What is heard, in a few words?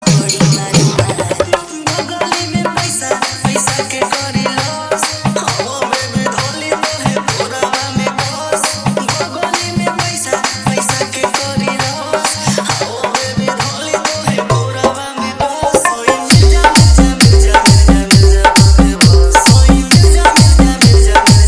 Dj Remix Song Hard Bass Mix